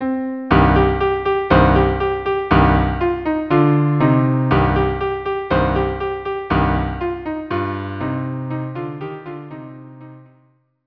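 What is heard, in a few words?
violin cello; O Hanukkah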